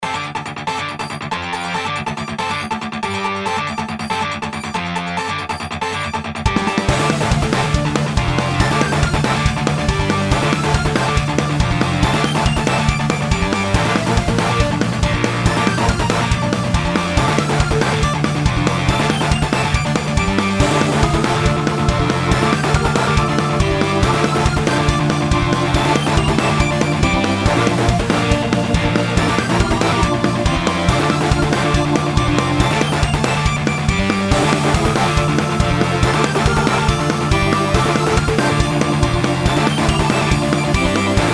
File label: battle